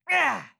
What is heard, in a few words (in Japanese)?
攻撃